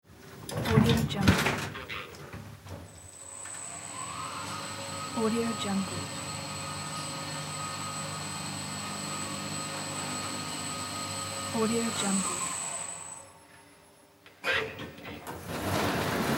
lift up

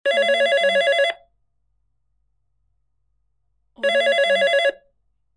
telephone ringing

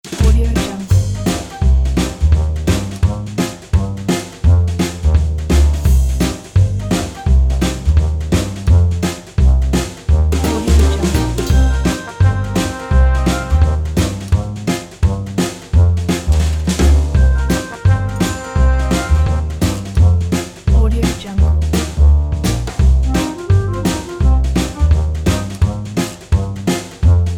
joy march

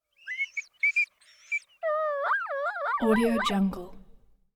coyote whimpering yelping crying; prosoundfx

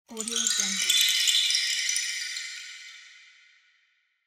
magic wooden sticks

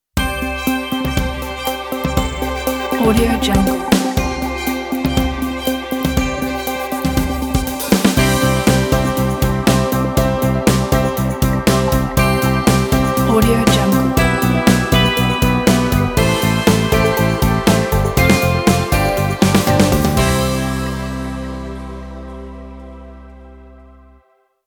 breaking news ident

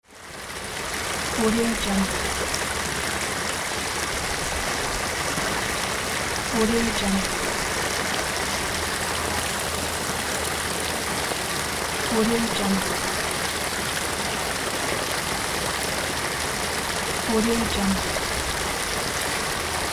waterfall

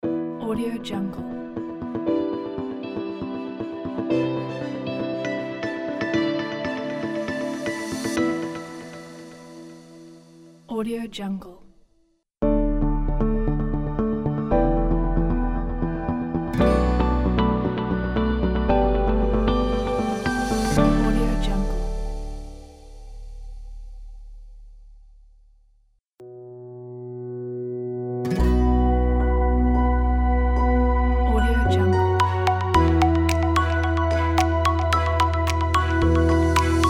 logo pack

